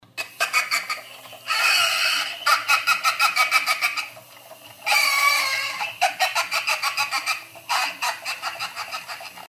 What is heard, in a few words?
robot laugh